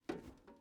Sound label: metal jump; teardown; ps5 windows xbox series xs